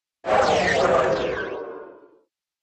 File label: Scifi Afterburner, spacecraft; Spacecraft Sound Effects